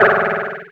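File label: car breaks down; The Wild Thornberrys Movie; kocsielront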